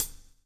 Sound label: BLUES TK, Backbeat; Spectrasonics - Backbeat